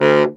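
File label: SAX STC D1, Baritone Sax Staccato; Orchestral; VarazdinOrchestral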